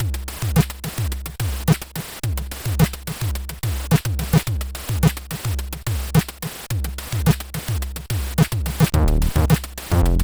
XO, CSG8580R5

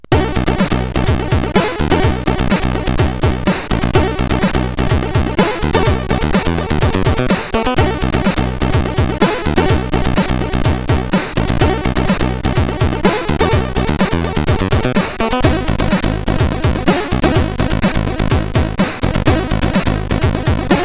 sid CSG8580R5; Outrun Europa

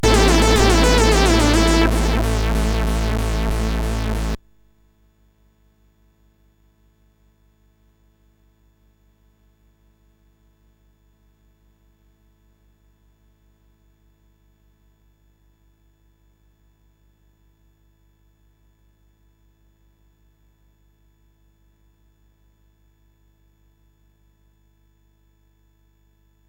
sid, CSG8580R5

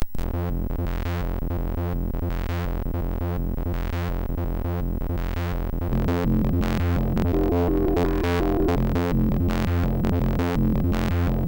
Hardware Malfunction sid MOS6581R2

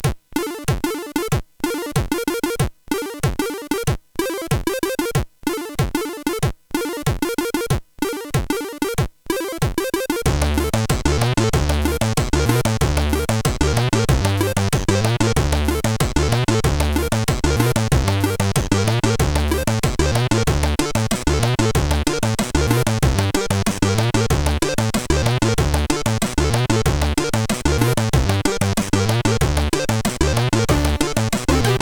Dump, CSG8580R5

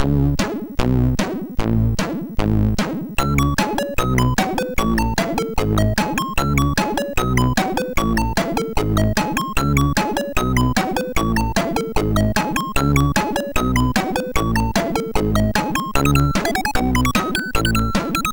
Music, sid MOS6581R4

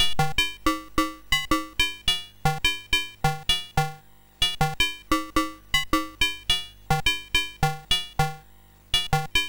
Pots and Pans, MOS6581R3

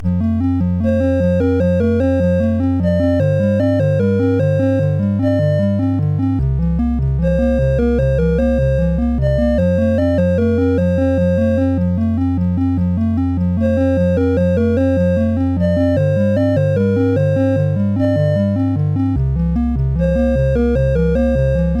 Soundwave Tubular Bells

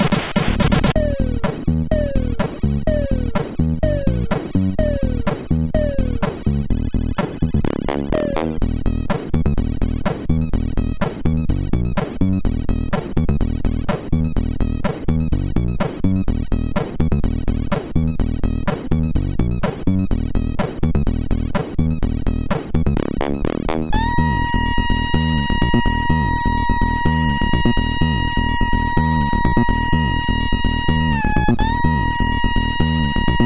Elektro Polka; hvsc

